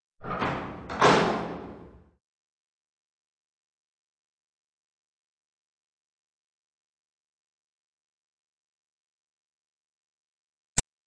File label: Track, Doors; 헐리웃특수효과음; 문 소리